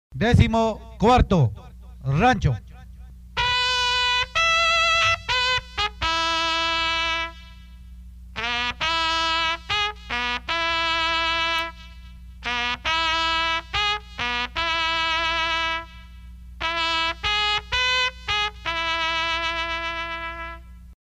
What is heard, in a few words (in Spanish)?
rancho, toques de trompeta de caballeria; himnos de caballeria